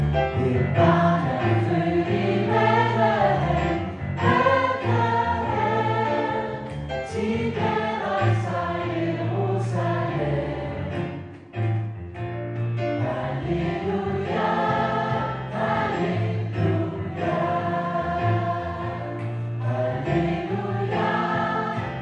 sopran, kor; et barn er fodt i bethlehem

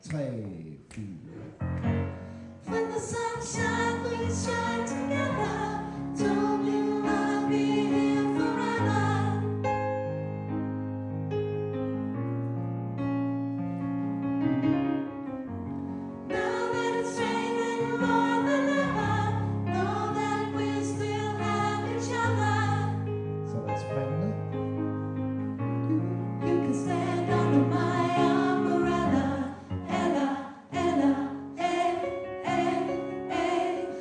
sopran; kor

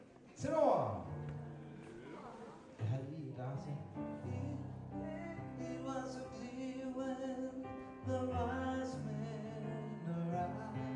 engelsk tenor; kor

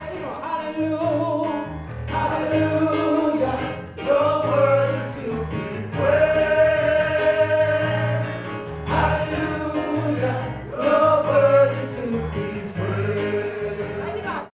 tenor, kor

